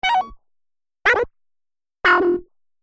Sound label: Multimedia Small Sound Pack, high tech sound effects